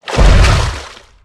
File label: tank walk water, footsteps; player; source engine; left 4 dead 2